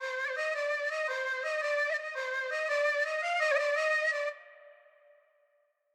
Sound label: ETHNIC FLUTE